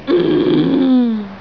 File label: hmmm; gémit